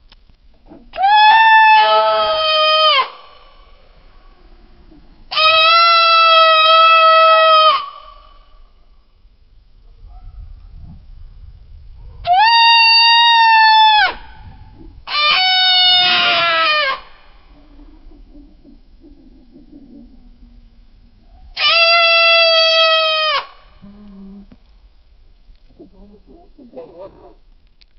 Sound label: buffalo screaming in pain